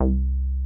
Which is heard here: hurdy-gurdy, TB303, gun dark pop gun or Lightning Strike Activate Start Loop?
TB303